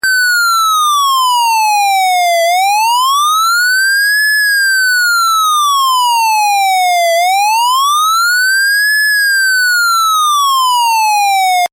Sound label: xcel wail